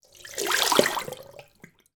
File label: sports drinking bottle empty water into basin sink; foley